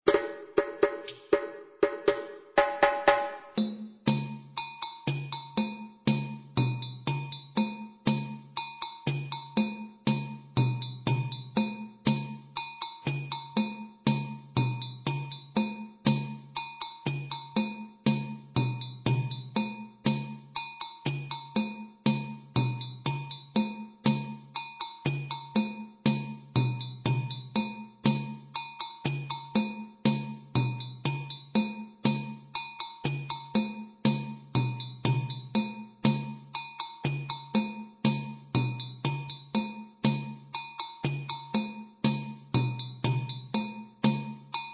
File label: Soli Lent dunun